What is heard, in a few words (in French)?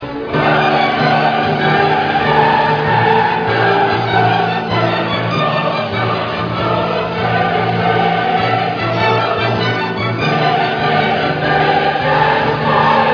Symphonie 9 Opus 125 Mvt 5 Choeur Choral